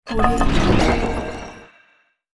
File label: magic stone spell; game sounds; طلسم سنگ جادویی